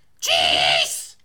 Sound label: shutter; cheese